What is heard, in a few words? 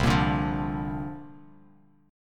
C 7th Suspended 2nd Suspended 4th C down Bass Standard; C7sus2sus4 strummed